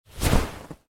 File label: Couch Up